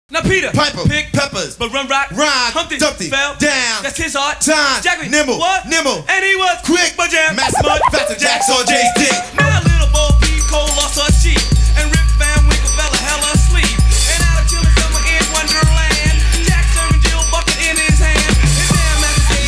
piper

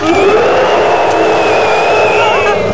scream

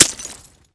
knife hit

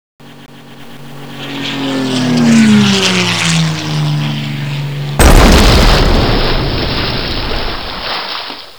p51 pass

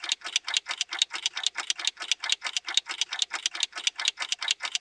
tick

